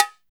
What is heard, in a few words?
LT BGO TONE, BONGOS; Drums In Your FACE!